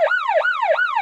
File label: siren loop mono; Blind Drive